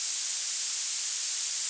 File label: soft slider slide; AriizuCA's Skin V3.17.6 Tail Yellow